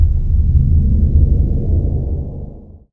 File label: F3 LOW GONG; Greytsounds VOL-1; SDX PERC